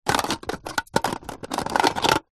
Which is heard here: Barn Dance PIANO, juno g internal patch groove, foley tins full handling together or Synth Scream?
foley tins full handling together